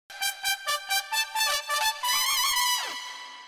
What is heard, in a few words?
Music Tone Ringtone; HBL PSL 2023